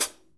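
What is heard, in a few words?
hi hat; Best Service Gigapack 2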